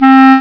Bb Clarinet

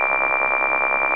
squeal; M4 7.5.2; M4™ (PowerMac)

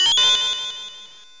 Extra Life; amigaformatcd29; screenplay; playpac

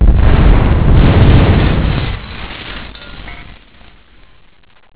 Ship Explosion; Más de 2,500 Juegos

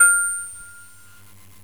Tinker Bell; GMTones; Percussive; Amiga 8-bit Sampled Voice

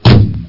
slam

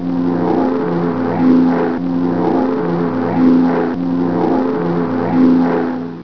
PLANE; Kids' CD-ROM Bible Fun Pack; Education Publishing Concepts; NOAHDEMO

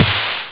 boost, drums snare; OctaMED SoundStudio V1

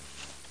rustle; 3D Game Programming All in One

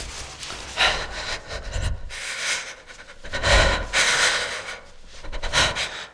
breath; DirectX SDK; DMusProd

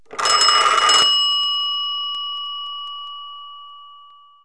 ant phone, house phones; KABOOM! - Wild and Wacky Sound Software

